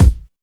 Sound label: present kick drum a sharp key; kick drum samples